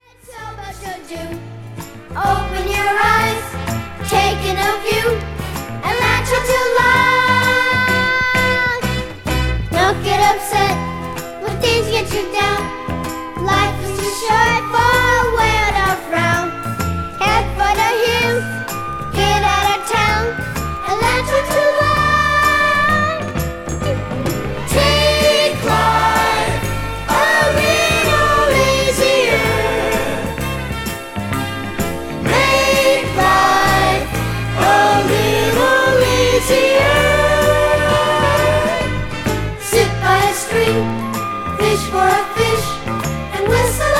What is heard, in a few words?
BELL